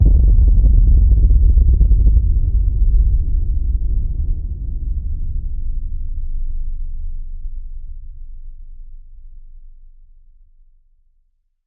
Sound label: Hit Low Grumble